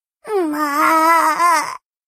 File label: death; nanachi